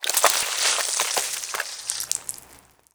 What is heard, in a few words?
blood; player